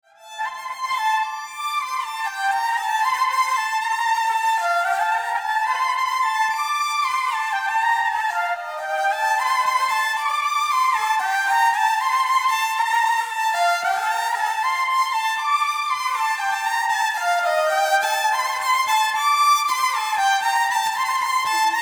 hyperviolin

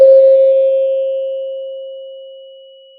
Sound of a church bell

